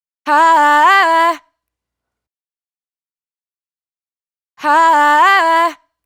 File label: riff 110 C, Vocal, Dry Vocals; Raw Vocals; Slate Digital Omnivox Vocal Sample Pack